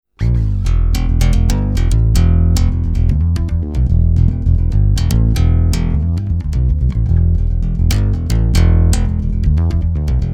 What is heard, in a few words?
Ampeg Godin 3 Outputs Parallel